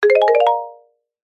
multimedia alert notification musical short marimba positive feedback; zapsplat